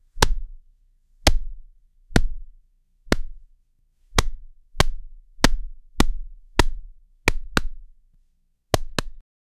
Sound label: fast combo fight sound; fighting